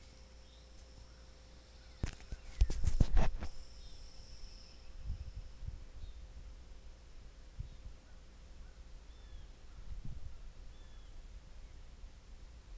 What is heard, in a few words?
Birds at Old House